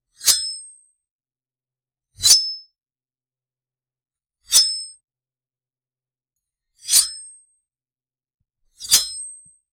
unsethe sword

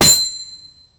a sharp metallic clank of, armor

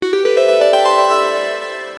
level up fantasy game

